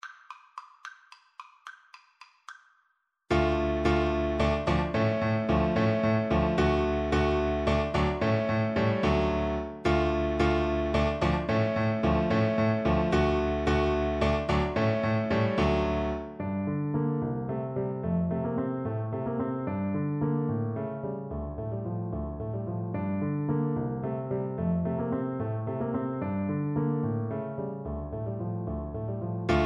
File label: dvorak slavonic, Tuba